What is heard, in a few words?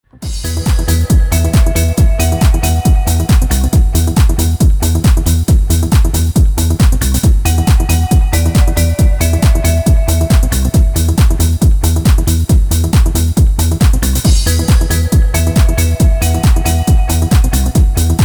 glockenspiel extended mix